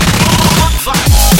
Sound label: bonfire